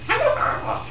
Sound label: parrots